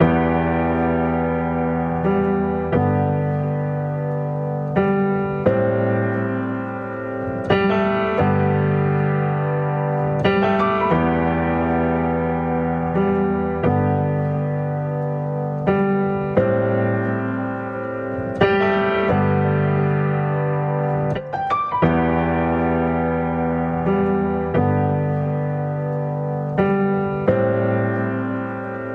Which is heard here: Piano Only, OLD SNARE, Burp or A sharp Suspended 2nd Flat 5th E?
Piano Only